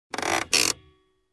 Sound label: Hand Brake